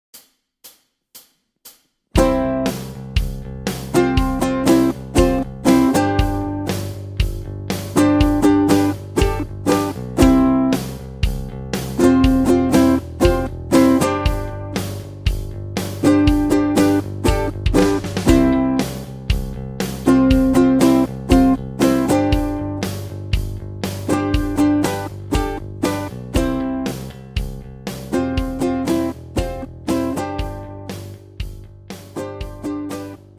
Groove; Malcolm Young Blog Groove Workshop

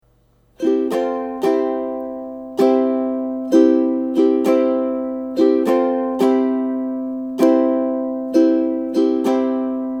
Main Strum, ukulele

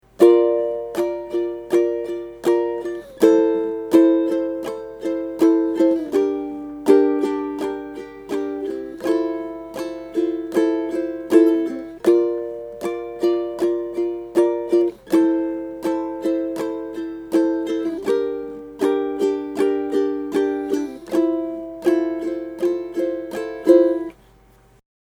Strum, ukulele

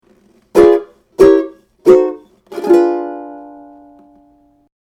sad trombone

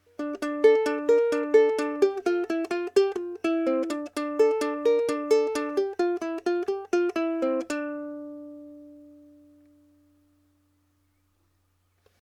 ukulele

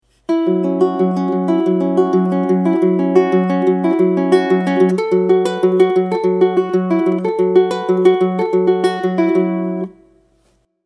Riff Fast, ukulele

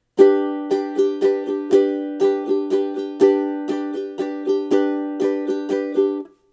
d dudu, ukulele

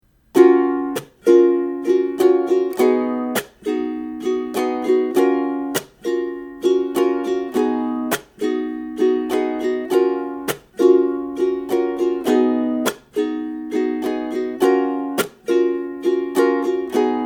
Strum, ukulele

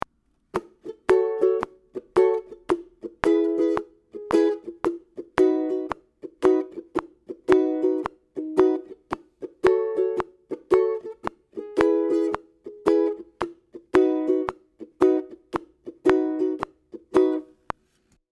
Strum, ukulele

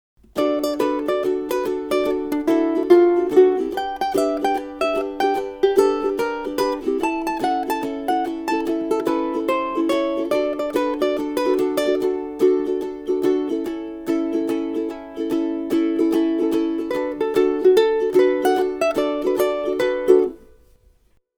Riff, ukulele

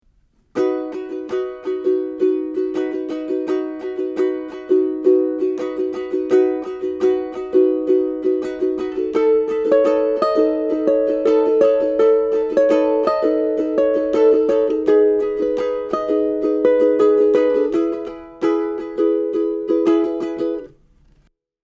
Riff, ukulele

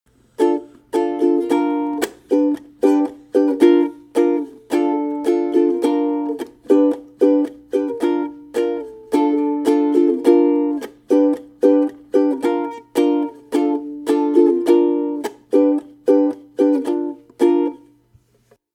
Chorus Strum, ukulele

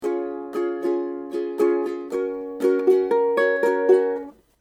Lick, ukulele

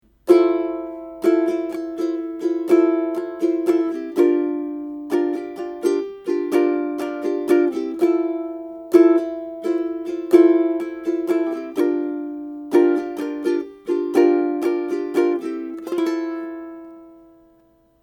Fmaj7, ukulele

